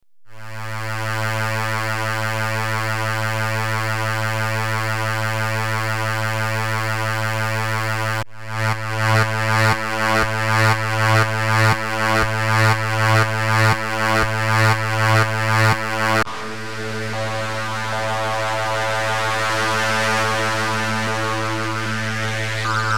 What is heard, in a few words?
Dist Coldfire Pad; ARTURIA